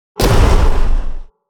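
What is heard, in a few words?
pounce stun; creatures organic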